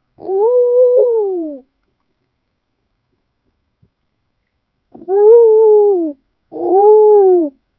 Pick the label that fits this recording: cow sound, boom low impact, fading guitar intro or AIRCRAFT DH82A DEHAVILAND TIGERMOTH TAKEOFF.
cow sound